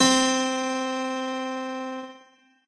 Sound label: piano; pianojs